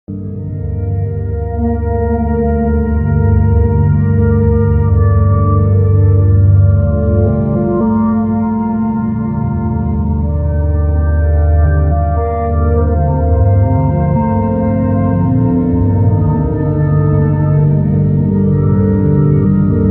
save room; resident evil 4 classico

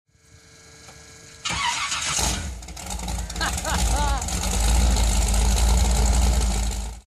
boat

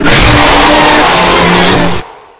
screamer; withered leopold

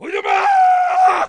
warcry; worms

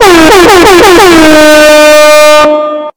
loud airhorn; mlg

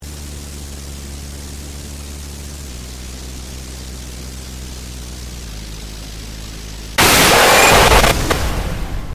jumpscare; rush

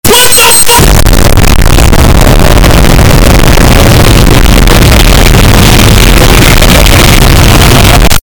ka boom cracked

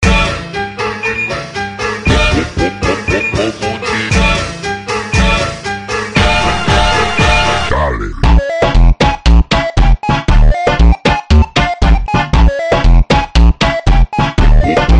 beeper funk

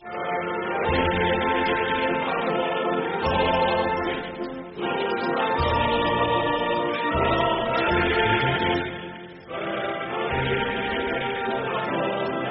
greek anthem

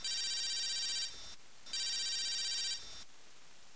ringing ringtone; gta iv

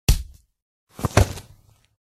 jumpscare sound hit; granny

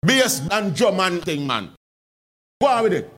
bass and drum and ting

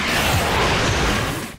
missile launch miss

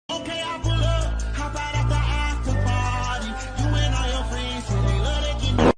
rap music with a fart sound at the end

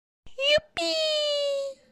yippeee

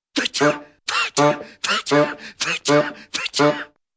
sneezes with honks

